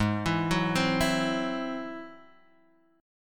G sharp Augmented 7th G sharp, down, Guitar, Drop D